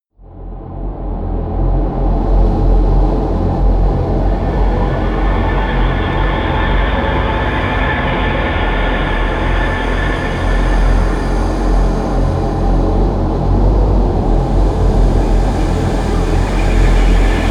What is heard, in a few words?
drone howl